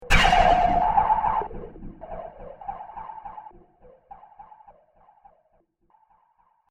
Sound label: Alien Blast Impact And Decay